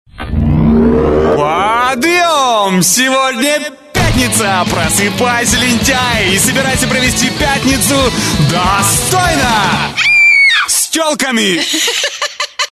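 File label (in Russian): мелодия на будильник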